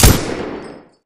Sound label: shoot; EMXArms; emx mg90